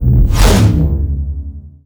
thawing

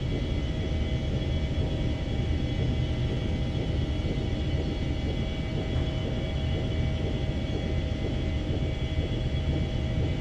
arc machine, ambience

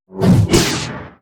beam sword; Weapon

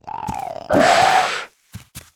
Lizard war attack

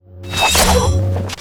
war attack

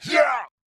attack; ACCRETIA

